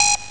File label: Shield Disappear; MindOut! Demo